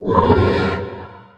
mpoltergeist idle; STALKER; customnpcs

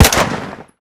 sok94 fire; STALKER

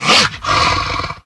pdog make hit; mutants; STALKER; customnpcs

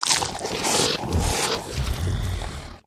mbloodsucker eat; STALKER; customnpcs

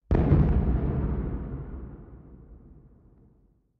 ambient shooting; STALKER

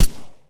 sbr silencer; STALKER

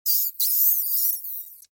rat agroed; STALKER; customnpcs

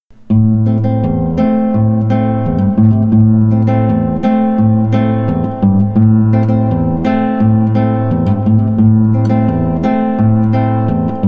guitar; STALKER

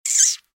mrat take hit; STALKER; customnpcs

